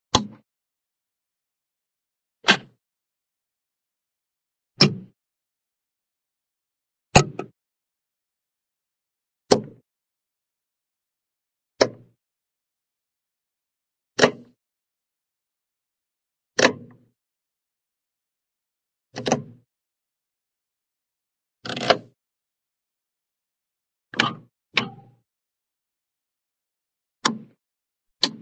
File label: switch, cockpit, cockpit switch; cartoon